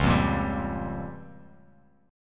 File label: Db 6th Flat 5th Bass Standard, Db6b5 chord; Db6b5 strummed; JGuitar